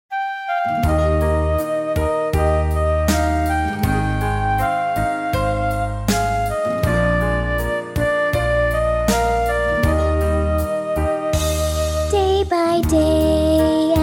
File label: Childrens Choir